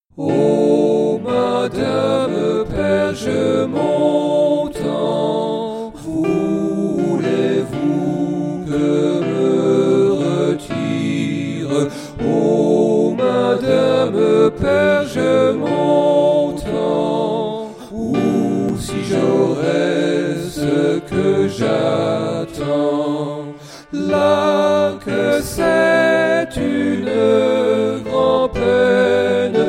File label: Tutti